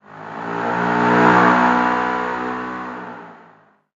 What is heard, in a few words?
bike bypass; Transport